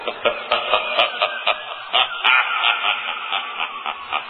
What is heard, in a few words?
evil laugh